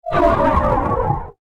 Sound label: Sci-fi Engine; Production Element; science fiction sound effect; Andrew Fleming